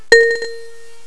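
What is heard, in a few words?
XYLOPHONE NOTE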